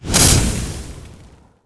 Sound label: mangle; Warcraft 3